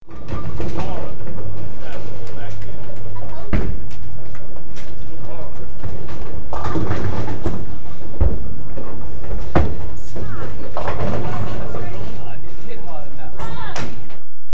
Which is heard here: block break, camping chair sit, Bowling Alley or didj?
Bowling Alley